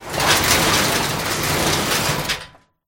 table drag; scripted sequences; hideout

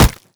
body impact; headcrab classic, movement; creatures

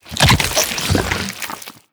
bite digest, barnacle creatures; Half Life Alyx

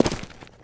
concrete, footsteps; player

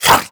grunt, headcrab, creatures; headcrab classic; vox

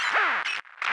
radio random, prison; Half Life Model Pack; Half Life 2; ambient levels

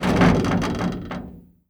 move, hinges drawers; seesaw; Half Life Model Pack; Half Life Alyx

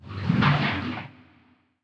water lap; nuke

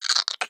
vox hang, creatures, snark

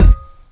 physics cube sm; Portal 2

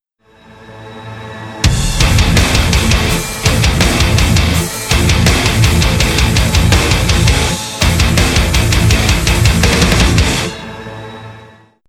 bomb ten sec count, music

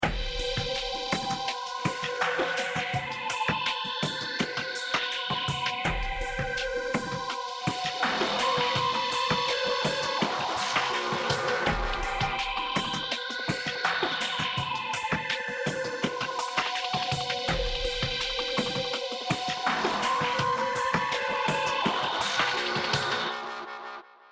choose team, music

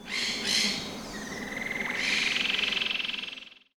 jungle bird; ambient animal; Counter Strike Model Pack; CS:GO

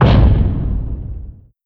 step; creatures; strider; seq passby

